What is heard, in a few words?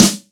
dirty snare one shot g sharp